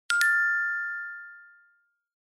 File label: new notification